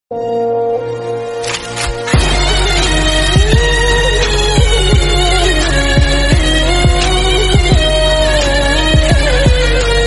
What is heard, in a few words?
killer mass bgm song; maari 2